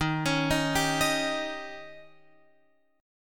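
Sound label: E flat 13th E flat, down, Guitar, Open F; arpeggiated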